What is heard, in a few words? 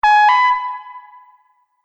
Multimedia Event Notification, beep sound effect